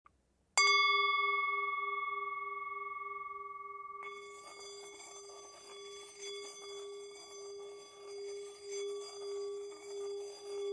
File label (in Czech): tibetska misa mala; Zvuk tibetské misy Wo; palička na hraní; Součástí tibetské mísy Wo je i palička na hraní.